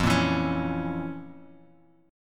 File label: F Major 7th Suspended 4th Sharp 5th F, Bass Standard; FM7sus4#5 strummed; JGuitar